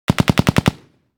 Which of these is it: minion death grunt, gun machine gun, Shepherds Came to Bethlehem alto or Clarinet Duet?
gun machine gun